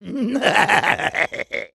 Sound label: Soldier laugh evil, fr